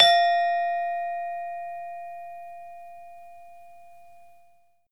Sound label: Gamelan balungan pelog